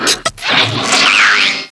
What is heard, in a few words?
drone flinch; gearbox